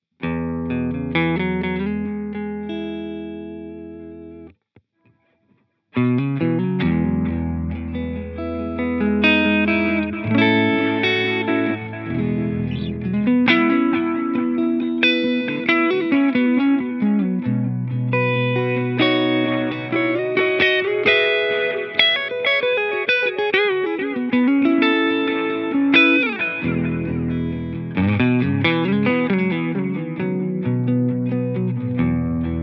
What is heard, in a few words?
Analog Tube BBD, echosystem